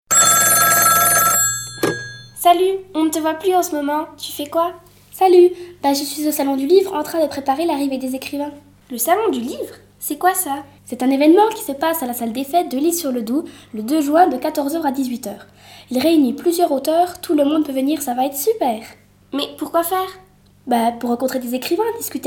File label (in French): jingle salon du livre